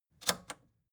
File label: record player power click on slow